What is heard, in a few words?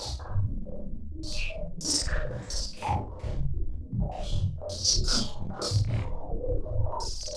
Moving Noise 130, Tempo Loops 130bpm; stereo toolkit samples